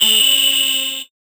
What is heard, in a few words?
VocoBit Noise, 8-bit; musicradar; 8-bit bonanza